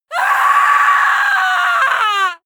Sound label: female scream horror; schreie; menschen